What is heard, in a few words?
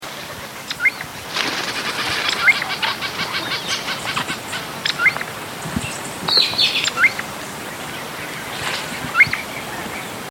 groove billed ani calls